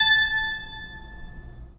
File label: 3D Organs Pipe Mix Celeste